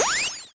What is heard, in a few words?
door Open; Kenney's Sound Pack; Sci-Fi Sounds